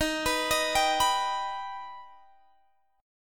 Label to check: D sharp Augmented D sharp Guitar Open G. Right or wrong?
Right